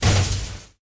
leg hinge; Apotheorasis

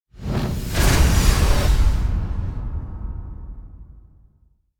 Door Slam Impact - Heavy Slow Motion Abstract; Hollywood Edge; sound designer's toolkit v2

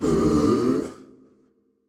Crowd Booing; Pitch Black Audio Pong

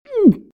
about To Drown; RadwinIsland

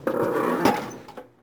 Theater Seat Squeak Sit; SFX Kit

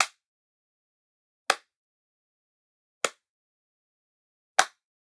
Generic Click Plastic Small

